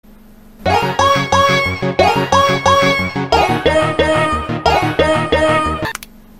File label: Noot Noot Siemens